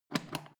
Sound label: foot switch turn on off quick